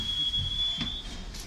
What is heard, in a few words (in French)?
arret, Metro